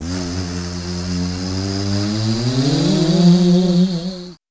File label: WEIRDOS, Voice Spectral